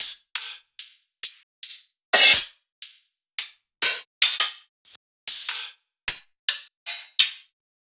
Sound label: Two Shots Open Hat; Sliced